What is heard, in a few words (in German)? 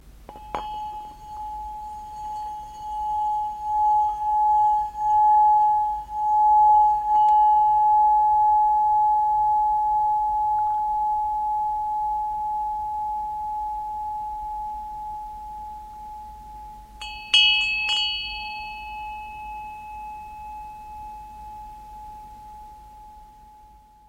Glocke